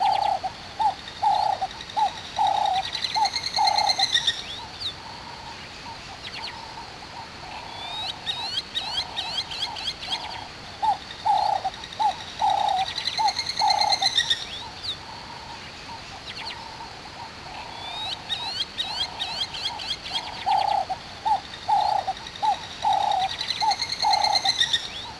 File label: ambience; Street Hoops; XBOX